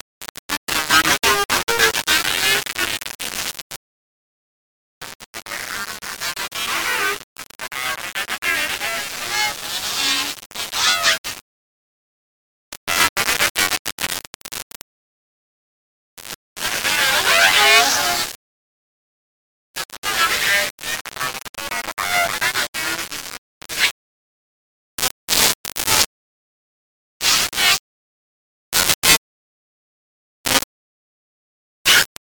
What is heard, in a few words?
Static Radio Glitches; bottlerocket